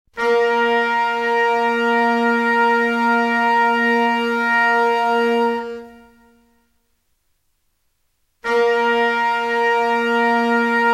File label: tuning note, band